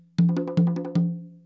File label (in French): frise, congas